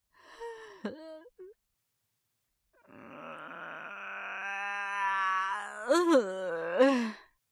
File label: groan; Devour 2021 gamerip pc macos